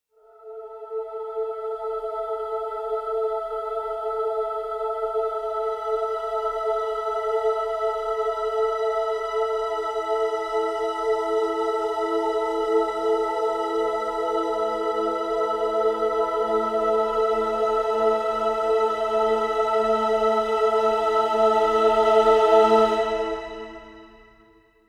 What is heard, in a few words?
String (A)